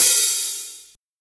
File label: big room open hat one shot a sharp key, open high-hat sound tuned to the A# note